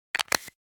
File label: camera lens cap; NCH Library